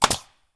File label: shot; simondev.Quick FPS1